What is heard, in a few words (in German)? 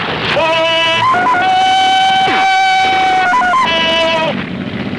Tarzan